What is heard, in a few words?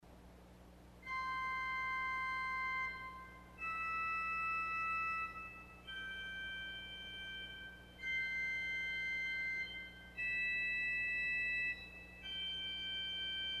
Cymbel Arpeg, organ stops; Burger & Shafer 1972; Möller pipes; CulverAcademy